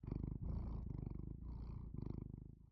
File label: Cat Purr